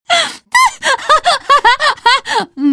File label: wind laugh